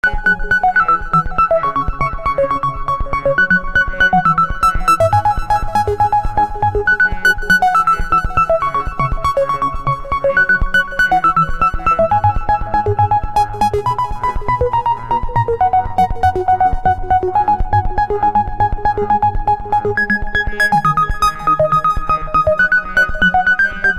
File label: cs30 high